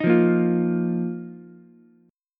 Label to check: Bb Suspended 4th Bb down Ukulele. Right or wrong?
Right